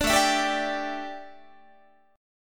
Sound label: A 7th Suspended 4th, A, down, Guitar